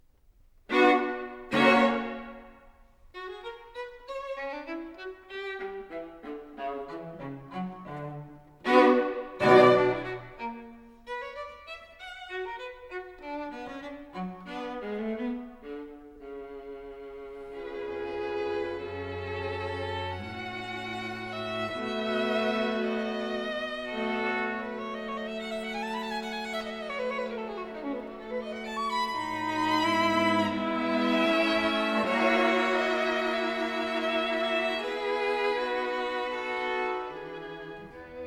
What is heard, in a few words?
quartet in c sharp minor allegro moderato; op 131; attacca